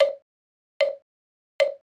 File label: mokugyo